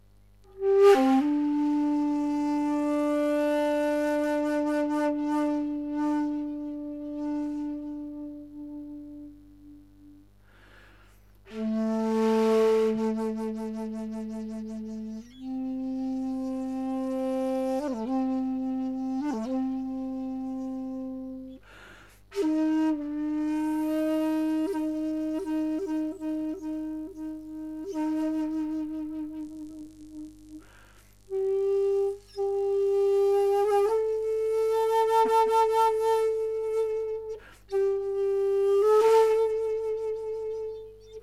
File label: Shakulute Alto; Tanifuji